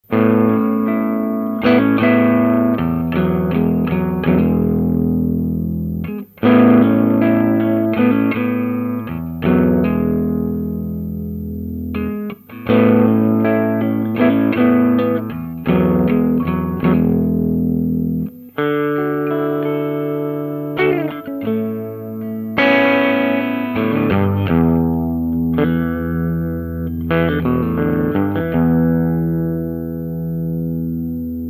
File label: Tele triode nofilter